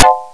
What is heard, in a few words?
plunk